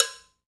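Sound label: percussion cow, cowbells; Roland L-CD701; PRC Latin 1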